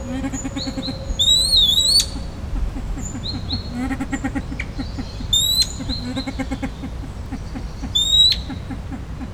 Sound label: Lophura nucthemera; Silver pheasant; A male alternates loud whistles with quiet calls